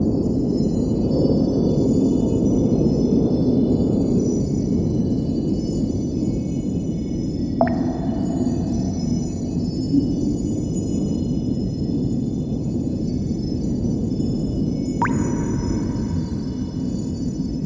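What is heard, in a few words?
ambience crystal cave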